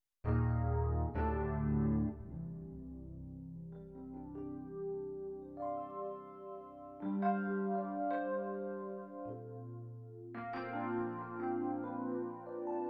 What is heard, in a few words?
rhodes; Kurz